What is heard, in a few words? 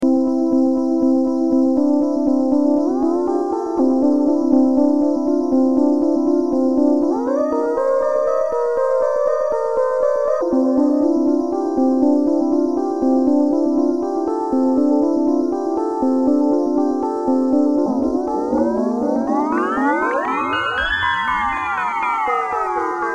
Synth